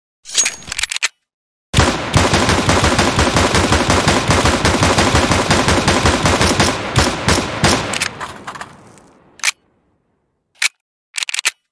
dual berettas

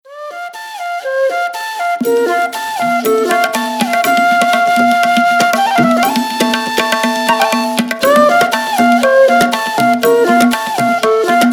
flute; Micromax

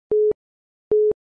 ios18 waiting; Системные звуки и уведомления iOS 18 (iPhone 16, 16pro, iPad)

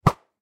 snow golem bow shoot, Minecraft; snezhnogo golema

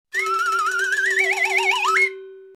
funny belt rattle

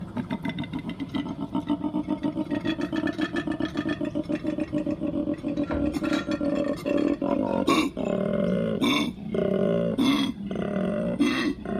koala

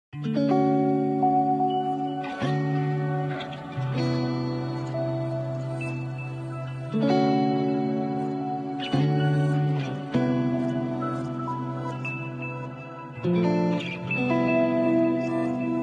go beyond guitar

